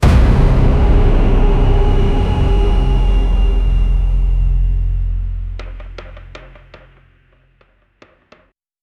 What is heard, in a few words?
dark intense cinematic bg